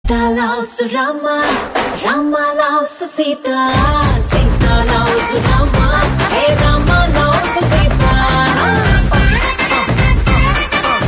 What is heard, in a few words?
Female Ringtone; Telugu; Vinaya Vidheya Rama